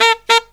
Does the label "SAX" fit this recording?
Yes